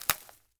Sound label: Creak Wood light